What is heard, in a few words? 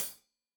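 hat closed, hi-hat cymbals stereo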